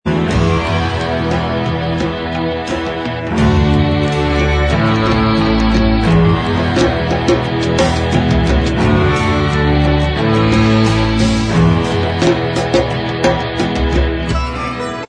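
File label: instrumental track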